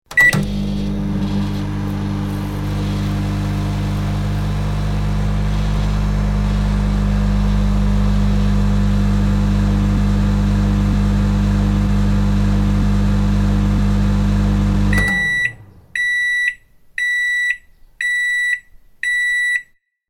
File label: Microwave, Microwave oven being turned on and ending with beeps.; Appliances; Household